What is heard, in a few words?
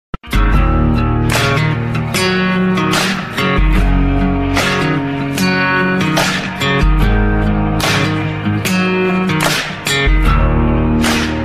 guitar ringtone